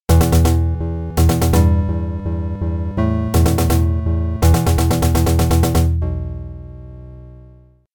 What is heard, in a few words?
Mission Completed; Syndicate